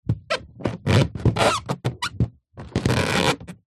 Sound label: foley balloon large inflated handling squeak